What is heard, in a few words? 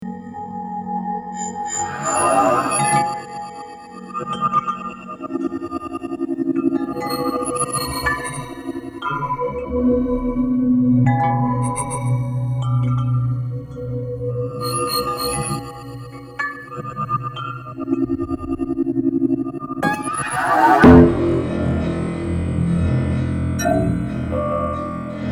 Wind Chimes